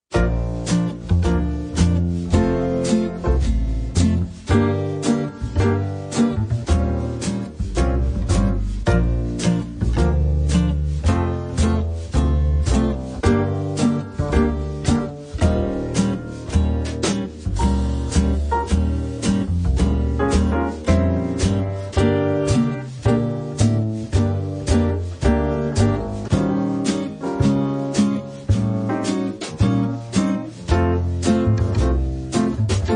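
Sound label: Normal Mode background music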